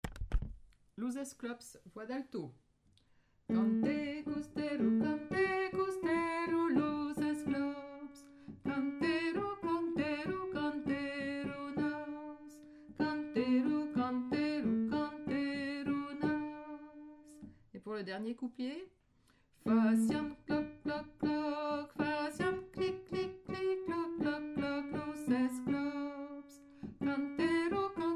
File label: alto; los esclops